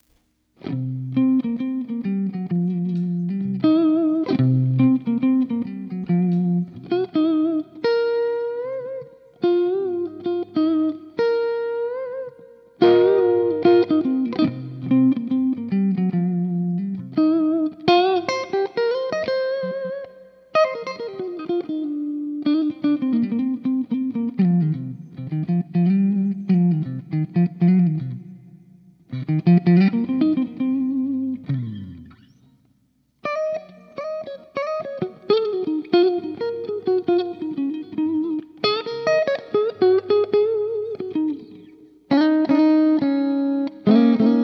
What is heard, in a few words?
clean